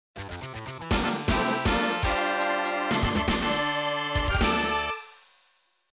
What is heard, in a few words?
Stage End Fanfare; Robo Go